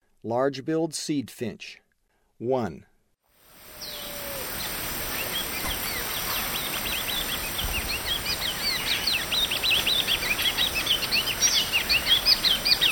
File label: Large-billed Seed-Finch; S. America; Eastern Lowlands